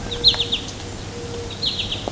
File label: goldfinch; natureNavigator